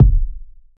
reverb kick drum one shot d key, bass drum single hit tuned to the D note